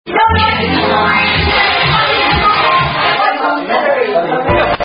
Football Chant

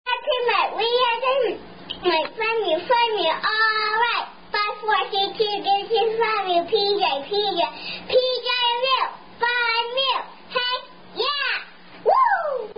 Sorority Chant Recited By Young Girl; Rack Em Up Stack Em Up; Phi Mu

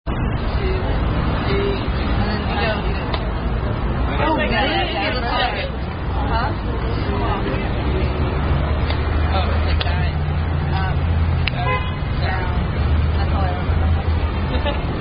Variant Clapping; Miss Mary Mac

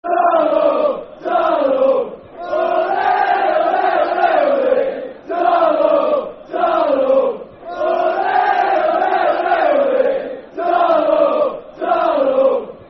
Ole, Ole, Ole, Ole, Darlo, Football Chant; Darlington Dfc

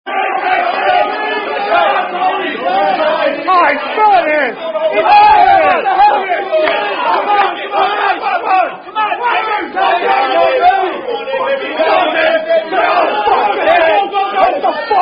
Boatrace Rugby [Boat Race Drinking Game 2]; Boatrace (South Buffalo Rugby Vs Lockport )

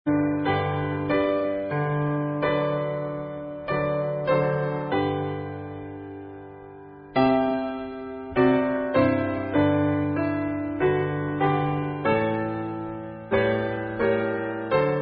Anthem (Piano Accomp); Piano Accompaniment; Sigma Phi Epsilon

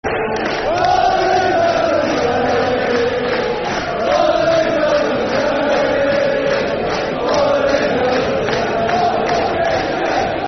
Oh Rangers, Football Chant (Soccer Songs)

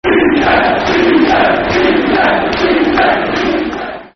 Easy! Easy! Football Chant (Soccer Song); England

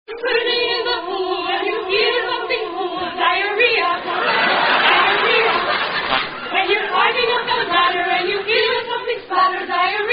Diarrhea Song

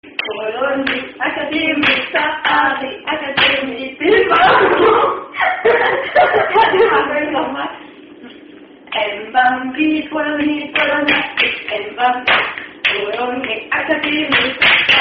German Sarasponda; Em Bam Bi

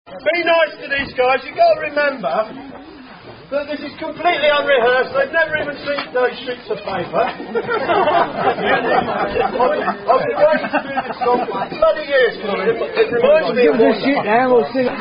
Hash Song Written And Sung From Sheet

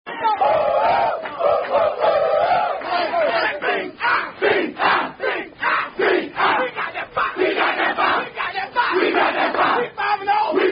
Locker Room Chant

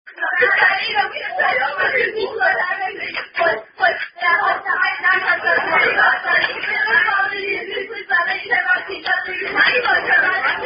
Apple On A Stick [Hand Clapping Rhyme-Game]

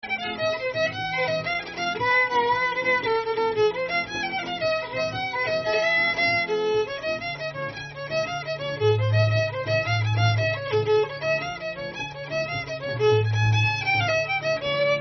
F Dm 6 8, Jig; Champion Jig B

